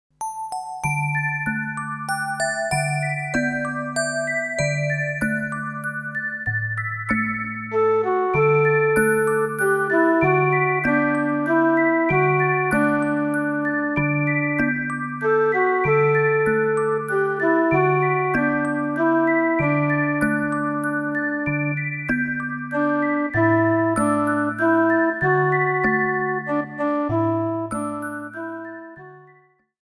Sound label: Twinkle, star (instrumental reprise); Nursery Nativity; school plays reception 3 to 5 years